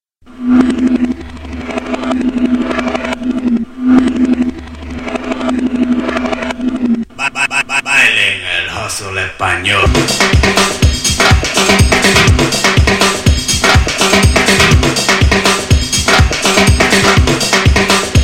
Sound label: fatback spanish latin